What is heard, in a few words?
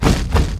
stomp; hero grawl